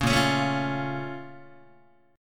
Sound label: A sharp Minor Major 7th, Guitar; A#mM7 strummed; JGuitar